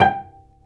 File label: STR VC, Vcs Pz dry; STR Vcs Marc-Piz